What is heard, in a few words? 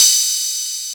Atlantex Music Percussion Computer Cymbal Choke